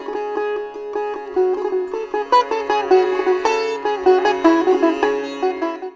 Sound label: SITAR; Spectrasonics; Heart of Asia